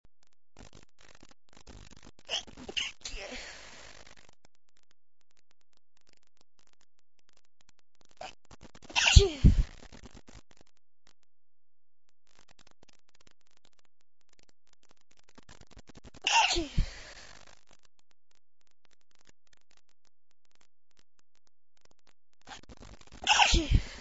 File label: tickles, female